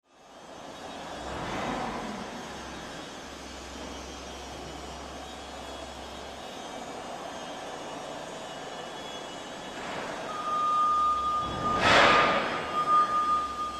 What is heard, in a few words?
Forklift Warehouse Ambience; Machinery; Industry; Blastwave